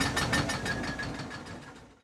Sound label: Brake Shudder Fade, Train; OpenBVE; Cl313 Silverlink